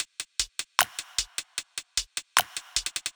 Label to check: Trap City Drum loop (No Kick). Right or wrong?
Right